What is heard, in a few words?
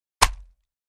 Axe Impact Body